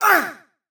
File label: SFX Grunt; SFX – Grunt 4; “Hyde” Foley & SFX; hyde ucsc hackathon game audio